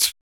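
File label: vox percussion, Vocal Planet Jazz FX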